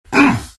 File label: pain, vo; arc warden